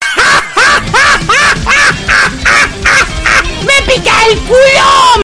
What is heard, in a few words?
jajajaja